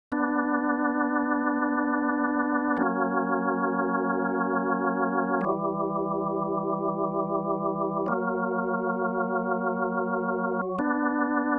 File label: ORGAN, hammond riff